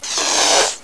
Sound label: Cat hiss